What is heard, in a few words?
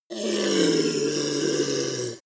ogre grunt painful short; kreaturen